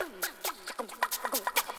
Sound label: boombap, Beatbox, Drum loops